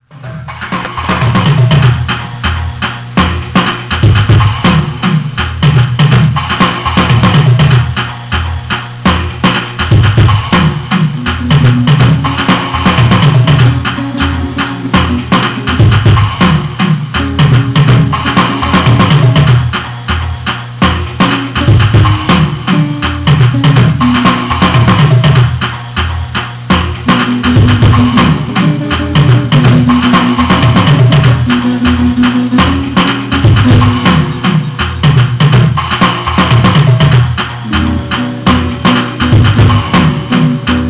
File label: future music